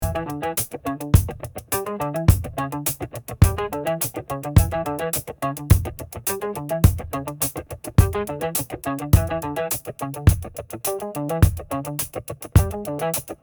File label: Funky groove festive mirror bounce